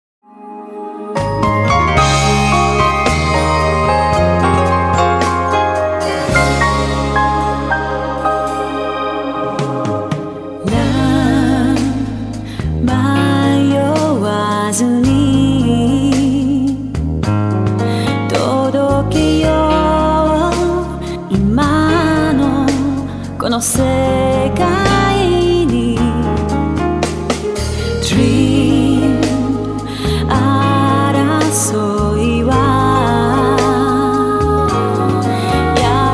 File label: Pops